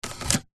vehicles car hyundai trajet heater temp dial turn; 2006

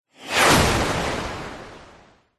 warfare missile rocket small launch fizz whoosh

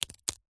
food papadum snap break

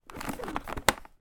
household air purifier cover remove

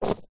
household necklace beaded place down on carpet

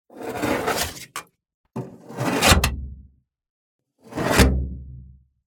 Just Metal Scrapes and Scratches; soundbits

